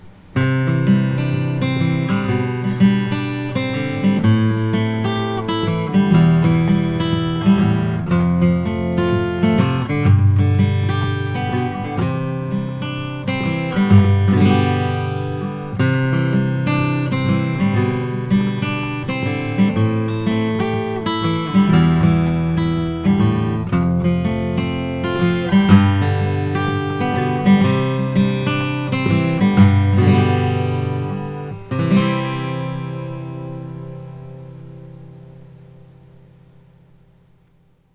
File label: martin 000 18; marquis; 1937